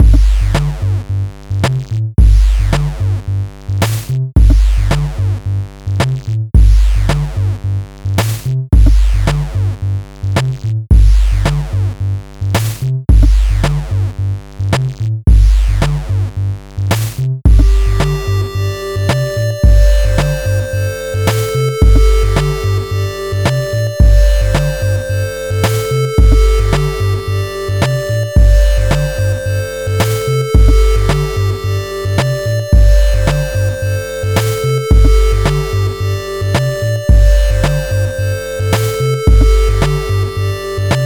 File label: famidrums